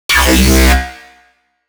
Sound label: fluctuate, bass